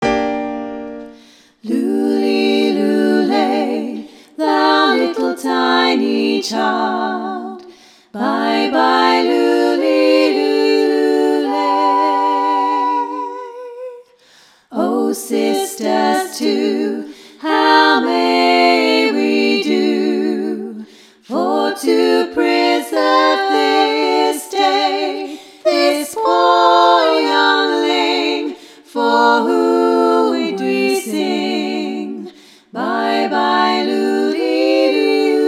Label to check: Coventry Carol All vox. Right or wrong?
Right